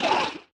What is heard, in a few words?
wriggler death; enemies; Factorio